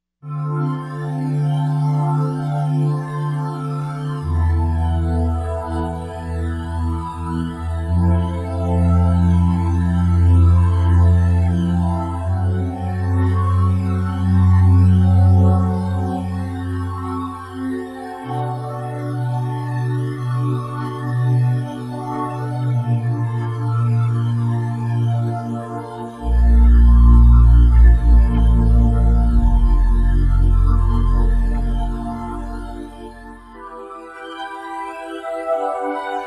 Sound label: Jet Pad